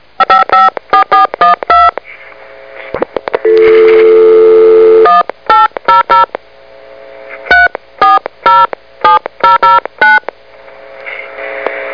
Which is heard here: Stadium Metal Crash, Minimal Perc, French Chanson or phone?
phone